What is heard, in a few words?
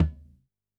Tom, drum